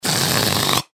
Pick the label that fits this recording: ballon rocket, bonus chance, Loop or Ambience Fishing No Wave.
ballon rocket